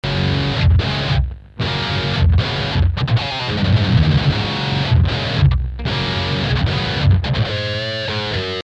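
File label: guitar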